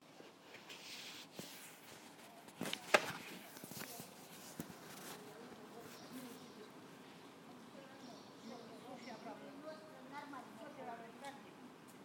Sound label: old women chat birds chirp